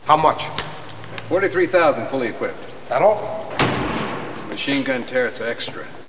machinegun; valve

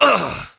pain

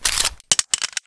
clip out, weapons; valve

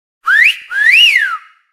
whistle; dutch; taunts

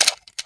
clip release; valve

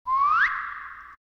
whistle; dutch; taunts

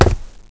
concrete; valve

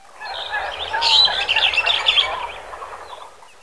Bird, animals; valve; ambient

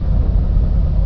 deep rumbler; valve; coil

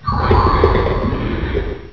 pain

